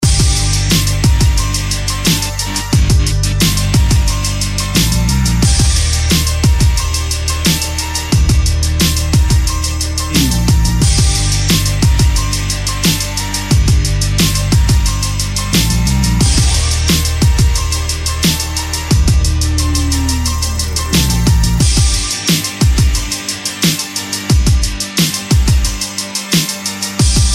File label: Instrumental